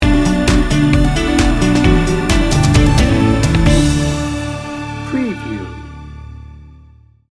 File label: dream builder sting bumper